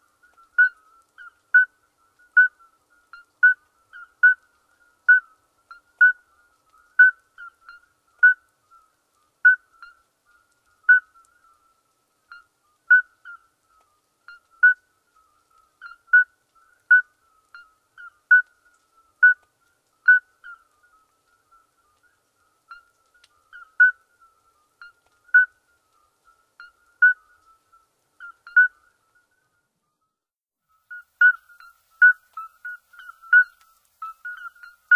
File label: Midwife toads; amphibians in france